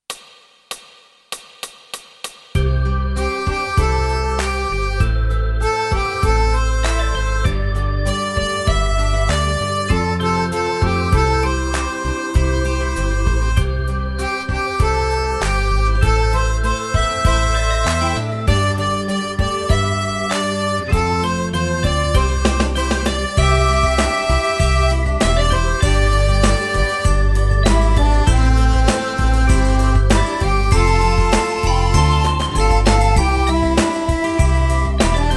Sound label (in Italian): Fisa tutti